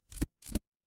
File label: paper fabric card flip